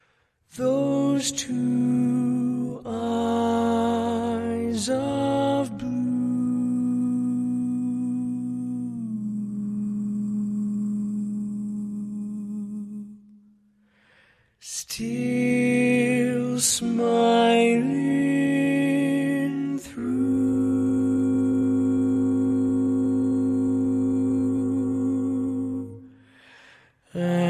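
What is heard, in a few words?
barbershop tags, baritone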